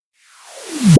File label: Board Move Sound; Jolly Battle; Android